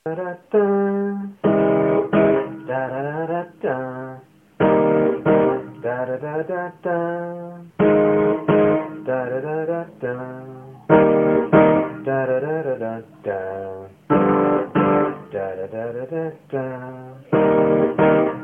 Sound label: blues